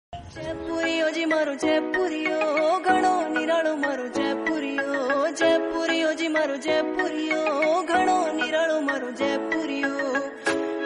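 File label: rajasthani song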